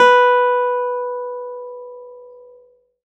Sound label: B3, Foundation Keyboards; Ableton Foundation FreePacks; Model-C BC